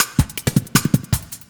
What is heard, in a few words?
LOOP SD, 160 BPM